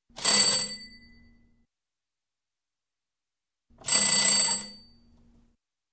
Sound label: Bell, Door Old Style Ring; Sound Ideas; Series 2000